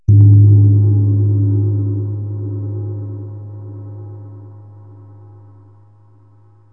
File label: cymbal